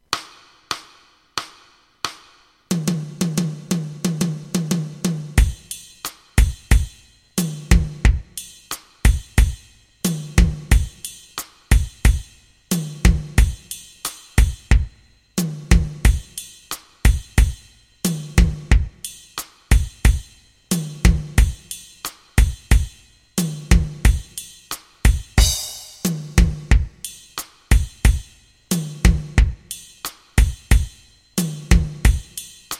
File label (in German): latin etuede